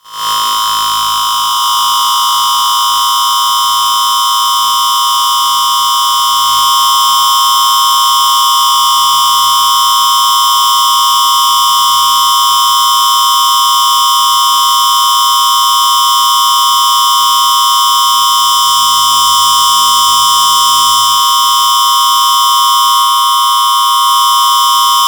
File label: High Frequency Feedback; Glitchedtones; Feedback Loop FX & Atmospheres